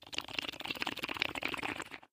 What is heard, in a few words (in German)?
vital trinkt; minecraft